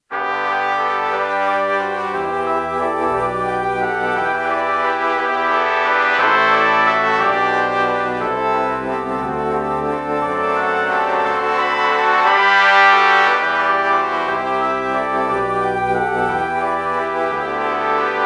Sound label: alto flute trumpet morph